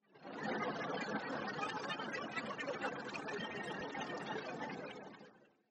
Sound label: audience laughter with applause